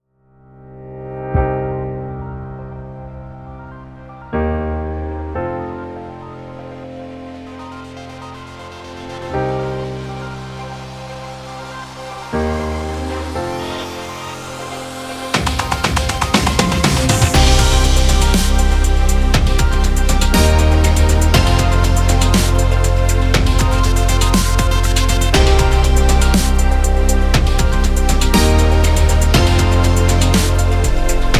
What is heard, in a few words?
Epic Electronic Technologic